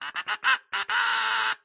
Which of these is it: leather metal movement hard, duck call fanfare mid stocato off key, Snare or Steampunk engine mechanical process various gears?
duck call fanfare mid stocato off key